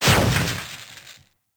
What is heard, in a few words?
Drone Explosion; Warframe; Caliber Chicks Minigame